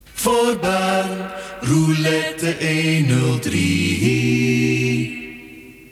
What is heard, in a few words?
silence